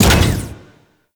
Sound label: Moa Leap Land Sweetener; Warframe; Venus; Venus Terra Moa; Abilities